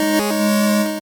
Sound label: 8bit se